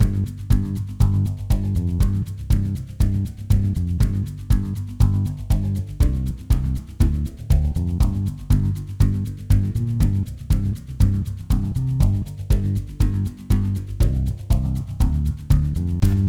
Question Music; Jackbox Games; The Jackbox Party Pack 5; YDKJ

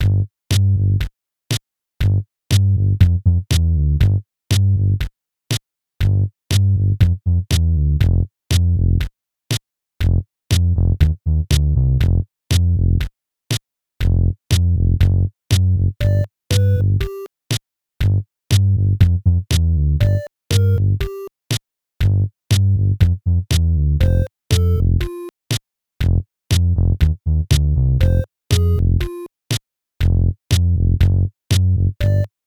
Main Background; Bomb Corp.; Jackbox Games; The Jackbox Party Pack 2

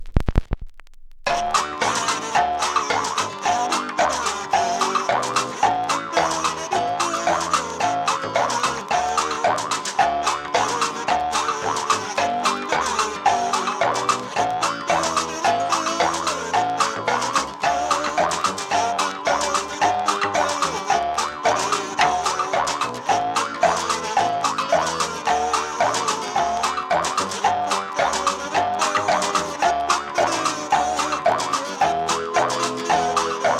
Kazooey; Jackbox Games; The Jackbox Party Pack 3

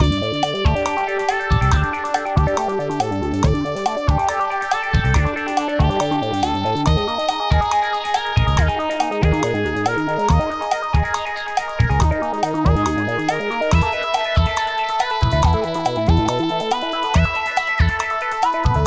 Choose Music; Jackbox Games; The Jackbox Party Pack 1; DRAWFUL

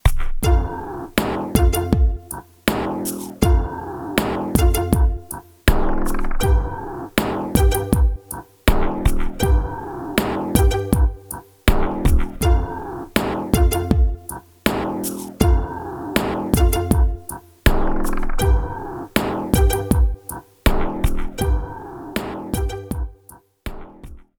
Rap Loop; The Jackbox Party Pack 5; Jackbox Games

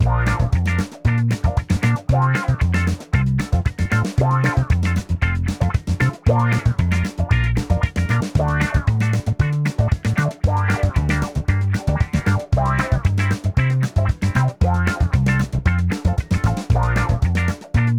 Main Theme (no lead); Jackbox Games; The Jackbox Party Pack 1; DRAWFUL

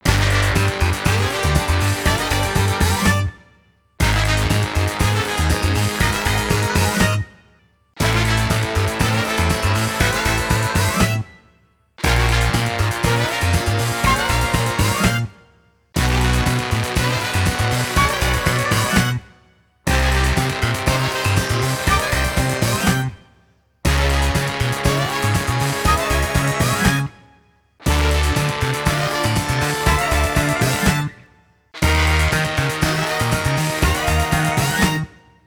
Answer Reveals; Fibbage 3; Jackbox Games; The Jackbox Party Pack 4

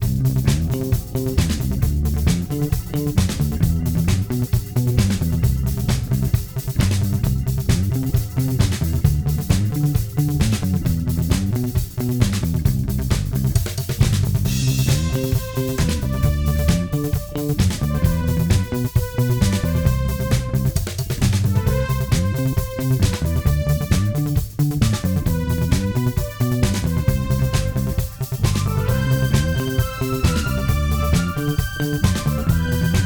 Credits Loop; Zeeple Dome; The Jackbox Party Pack 5; Jackbox Games

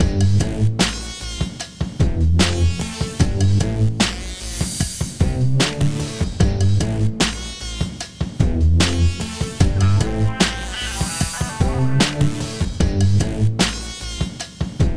Rap Loop; The Jackbox Party Pack 5; Jackbox Games

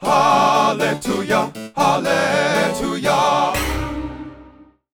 Question Segue; YDKJ; The Jackbox Party Pack 5; Jackbox Games

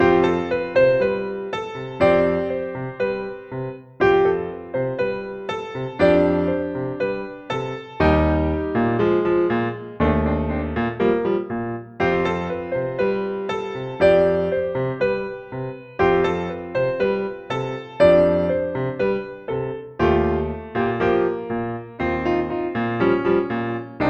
Draw Music; Bidiots; Jackbox Games; The Jackbox Party Pack 2

